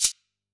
Shaker; musicradar; Drum Hits B

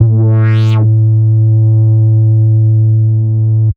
Tech Bass A1, techno; Bass Multi; musicradar

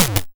Snare, YM, CHIPSHOP HITS DRUMS; ChipShop Deluxe

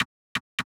Perc, techno drum

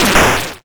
YM Perc, DRUMS; ChipShop Deluxe; ChipShop Hits

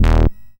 SID Bass Em, chip; CHIPSHOP HITS

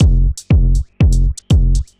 Tech Beat 120, techno 120bpm; musicradar; Beats

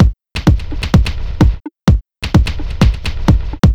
128BPM No Bass, techno; drum fx; Beats

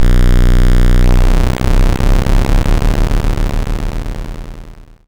YM Bass B, chip; ChipShop Deluxe; ChipShop Hits

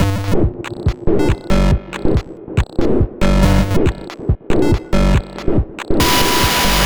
Combo G, 140 BPM; CHIPSHOP DELUXE; CHIPSHOP LOOPS

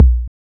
Kick, techno drum

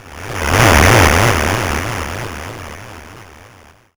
Crowd; ChipShop; CHIPSHOP DELUXE; CHIPSHOP HITS; YM

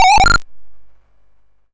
Crash; ChipShop; CHIPSHOP DELUXE; CHIPSHOP HITS; YM

